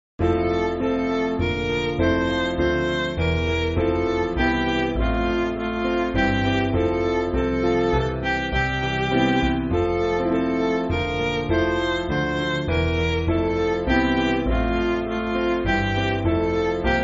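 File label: Midi, Music Backing Files for Churches; Joyful Joyful; Ode To Joy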